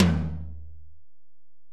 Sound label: Tom, Alesis DM-PRO, Drum Machines, Toms